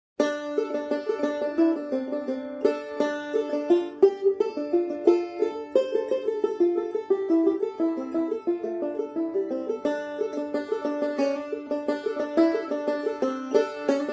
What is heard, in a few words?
Banjo; Zomboid; Lifestyle